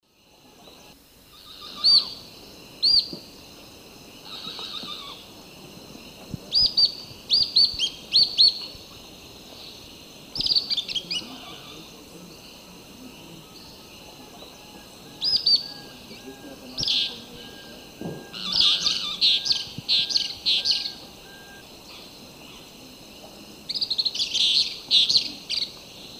Tyrannopsis sulphurea, song and calls 2 birds; S. America; Eastern Lowlands

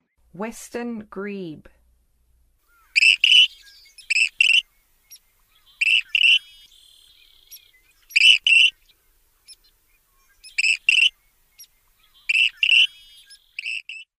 Western Grebe, Songs of Birds of North America

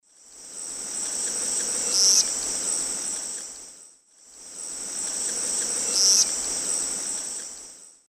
Tachornis squamata, call; S. America; Eastern Lowlands

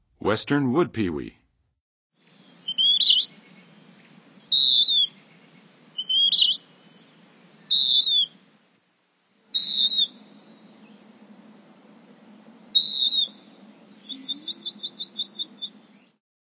Western Wood-pewee, Songs of Birds; North America; Western USA; Western USA Songbirds

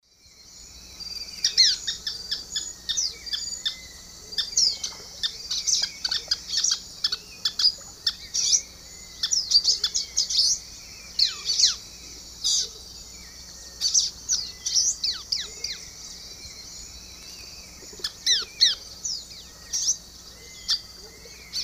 Myiozetetes granadensis, calls; S. America; Eastern Lowlands

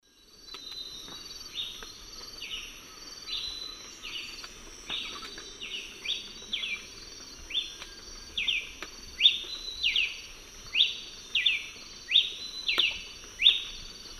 Ramphocelus nigrogularis, subsong and call; S. America; Eastern Lowlands

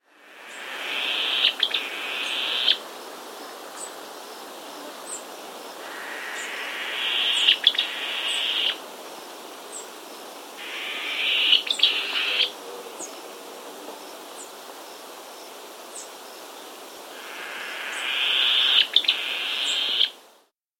Yapacana Antbird; S. America

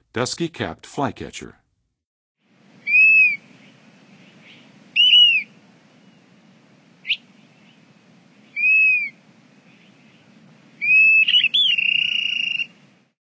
Dusky-capped Flycatcher; North America; Western USA; Western USA Songbirds

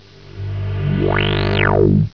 MOOG

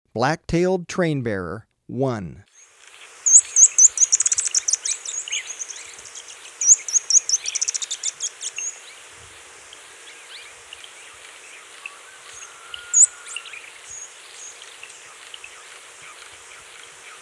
Black-Tailed Trainbearer, Birds of Ecuador; S. America

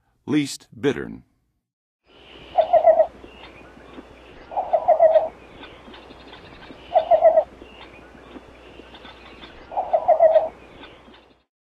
Least Bittern, Birds of North America; Western USA; Western USA Songbirds

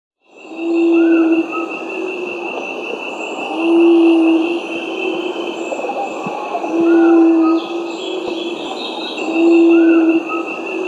Ruddy Quail-Dove (Geotrygon montana), Peru; S. America

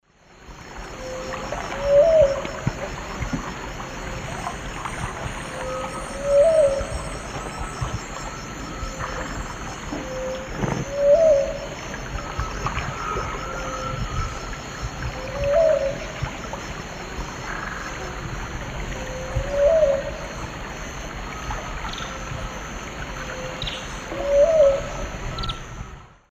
Leptotila rufaxilla, song; S. America; Eastern Lowlands

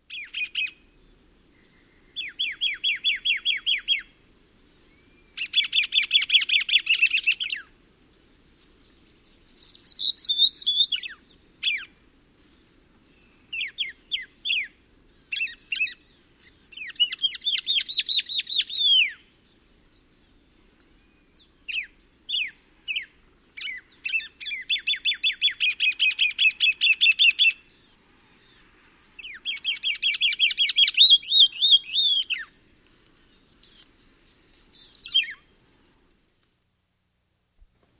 Scarlet-Rumped Cacique; S. America

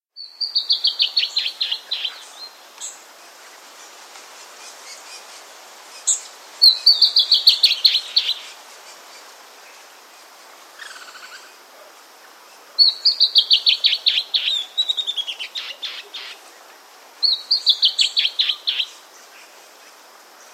Bertoni's Antbird, Nature's Songs, S. America

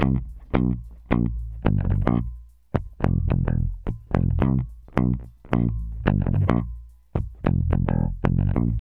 Bass, RnB

